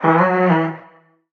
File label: vocal eos Em; SD AURORA Sample Pack; Slate